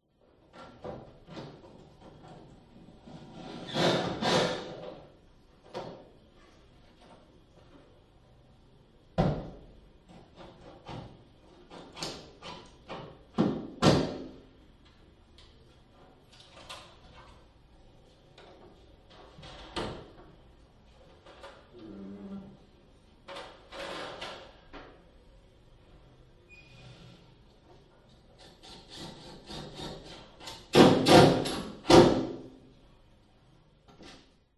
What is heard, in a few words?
industrial electrician drilling wall in other room office room reverb 5m distance; zapsplat